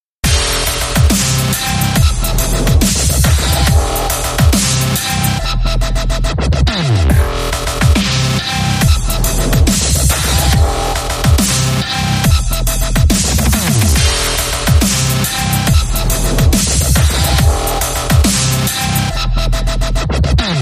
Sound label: Irishstep